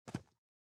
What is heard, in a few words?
SFX run rock; gamerip; Out of Reach: Treasure Royale